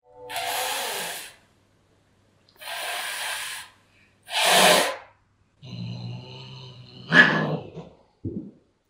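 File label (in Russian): кошка раздраженно шипит на миролюбивого кота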